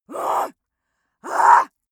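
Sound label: female angry, screams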